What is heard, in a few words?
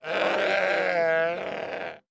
Sheep Baa Frail Sick; sound effects bible